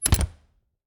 Refridgerator, old fashioned, wooden, steel, brass clasps, hinges, close, squeek, piercing; Eiravaein Works; Vaeyan IV